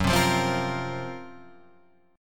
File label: F sharp Major 7th Flat 5th, Guitar, Drop D